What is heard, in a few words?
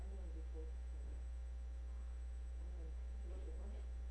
car